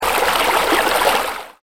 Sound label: waterfall